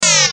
Video Game Beeps; Vintage Beep Chime

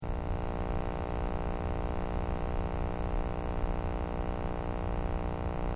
ELECTRONIC RECIEVER TUNING LOOP; Various machines; Electrical Buttons; Sound Effects:Multimedia

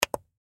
Switch Push, Switch Push Momentary Click; Switches (Sound Effects:Objects); Foley; Blastwave

